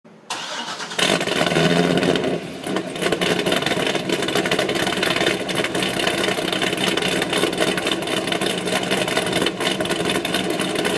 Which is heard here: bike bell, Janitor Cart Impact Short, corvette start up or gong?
corvette start up